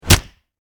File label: Impact Punch Face Fist Cuff; Punches and Hits; Combat